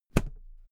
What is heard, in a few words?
briefcase close slam; industrie wirtschaft; bueroarbeit